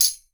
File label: RTHM-TECH, POP ROCK SET